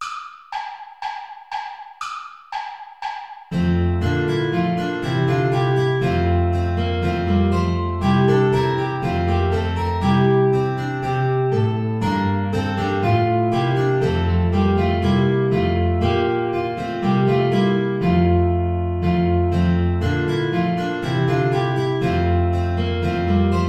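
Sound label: bourrée play along without guitar; praetorius michael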